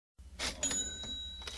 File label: ka ching sound effect